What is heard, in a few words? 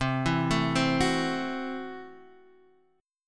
C 6th Flat 5th, C, down, Guitar, Half Step Down